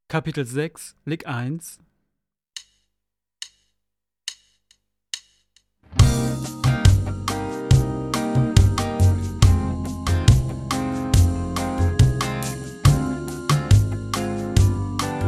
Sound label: Latin Style Guitar